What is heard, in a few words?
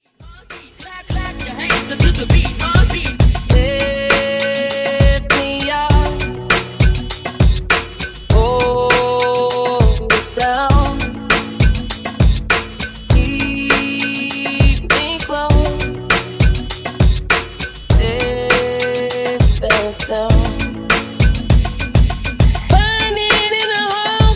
Funk Hype